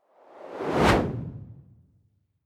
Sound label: whoosh simple hard incoming high